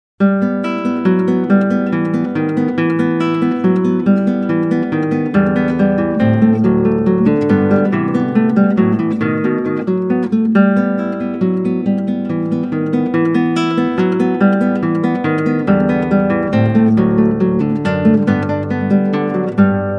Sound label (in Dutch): Klassieke Gitaar; Bach 50 Solos